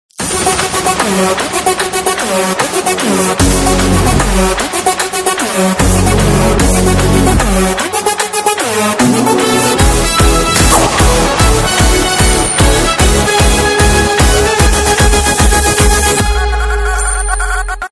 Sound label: Motion Poster BGM Ringtone; Sarkaru Vaari Paata